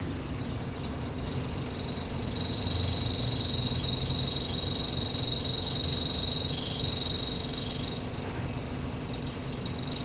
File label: windfree compressor noise, Ar condicionado